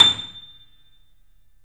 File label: A, Boesendorfer, 3D Pianos; E-MU Producer Series Vol. 5; 3-D Audio Collection